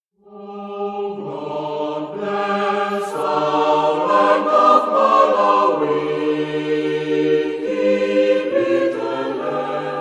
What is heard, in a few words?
anthem english singers